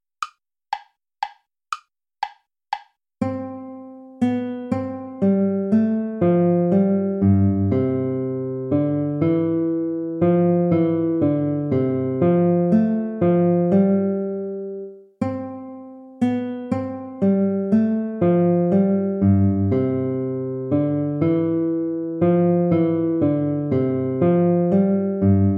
minuet play along

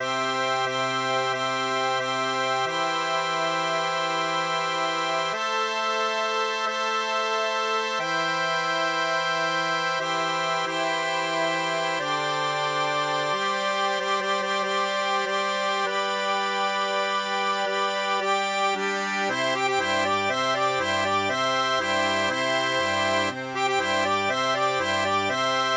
fanfare for clarinet quartet

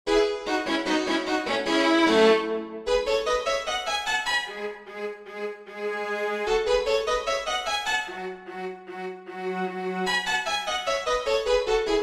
violin concerto, allegro vivace

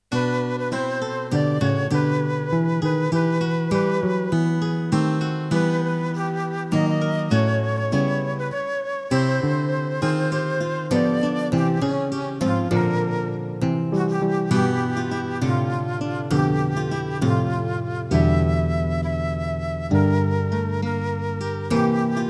jazz; azur

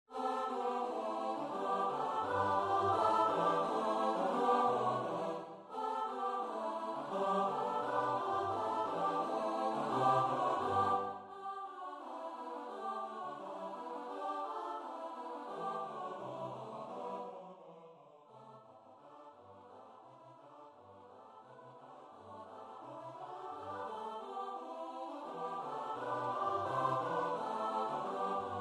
Romanian traditional carol

